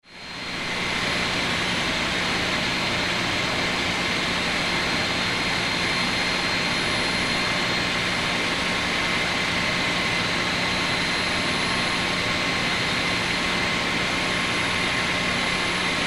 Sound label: Generator Large Electrical High Frequency Hum; Machinery; Industry; Blastwave